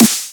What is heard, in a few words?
DUB Snare